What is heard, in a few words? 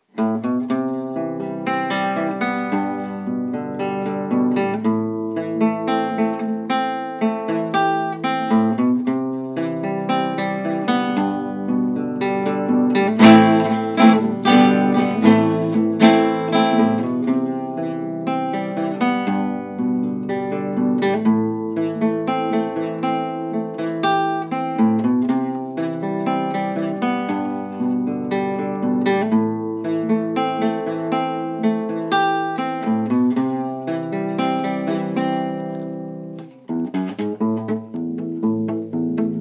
booored guitar